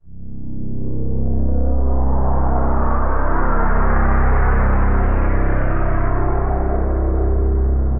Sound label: Ahab Bass, Evolving Bass; Ableton; Drone Lab